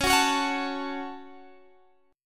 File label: C sharp Suspended 4th Sharp 5th C sharp down Guitar Half Step Down